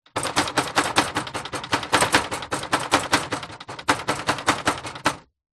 industrial plastic metal heavy machine or tall unit shake wobble